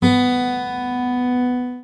GUI Ac., GUIT AC; Best Service Gigapack 2

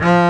STR X BOW BA, Strings x4, Str Quartet; Club-50 - Foundations Roland

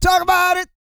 SING, Vocal Gospel; Spectrasonics; Vocal Planet

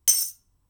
Beat Ring, drums singles; The Roots Drumloops

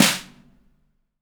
METAL, Snare; AKAI S6000 CD-ROM - Volume 3

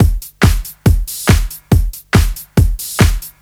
Kick Loop, 140bpm

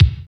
KICK, Tekno Industrial; East West

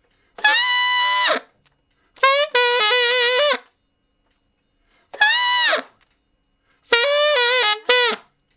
Ten Sax Straight (B), R&B; Solos

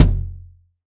Ritual Kick; Planet Earth; Digital Sound Factory Planet Earth

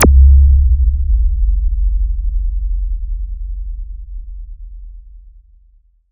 sub zap; Best Service Alpha Dance vol.2; ZAPS+THUMPS

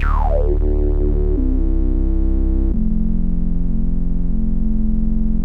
syn D min, Hip Hop & RnB; ProSamples vol.34 - Hip Hop & RnB 3

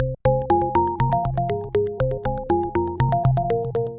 Modern Synth, Synth Textures; Sonic Foundry (Sony Creative Software); The Electronic Music Manuscript Collection

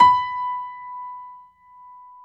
PF B4, G.PIANO; AKAI S-Series CD-ROM Sound Library VOL-7; G.PIANO 1

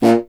C. Bassoon, solo instruments

